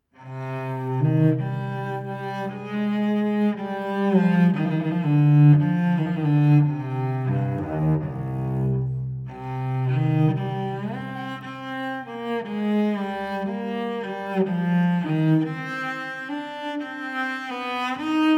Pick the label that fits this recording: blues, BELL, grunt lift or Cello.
Cello